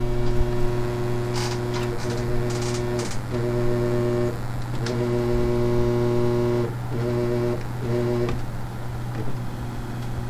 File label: noise